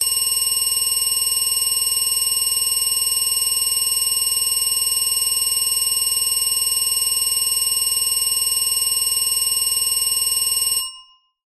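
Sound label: emergency bell ring fast looped synthesized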